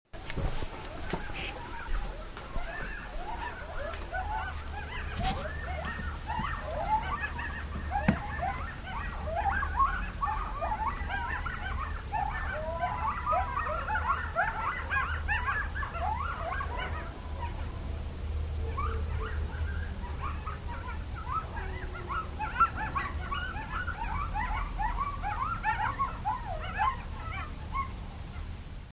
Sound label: coyotes